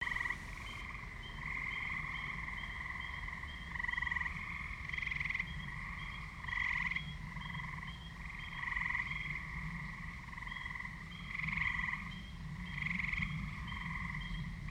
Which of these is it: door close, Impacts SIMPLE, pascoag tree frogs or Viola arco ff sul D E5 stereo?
pascoag tree frogs